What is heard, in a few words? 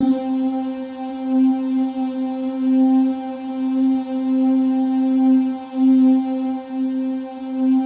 C4, Synth Leads